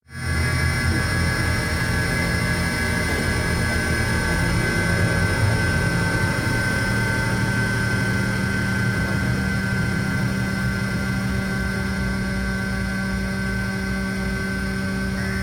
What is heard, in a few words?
Drone to Bell; Interlude